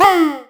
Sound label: caw